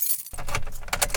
key, Cars; CosmicRage

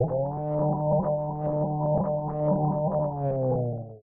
boost, nebula; CosmicRage

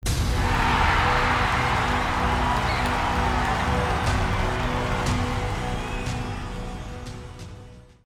pvp on

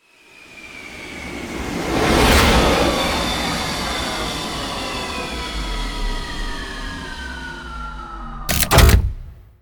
escape, ships

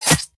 hit, weapons

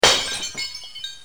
breaking glass; activities planetoid

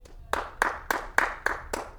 clap; CosmicRage

